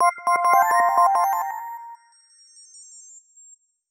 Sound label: Larkspur, ringtones; HTC One M9